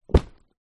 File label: bag ruck sack down on ground